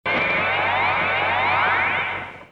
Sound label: Flip Sting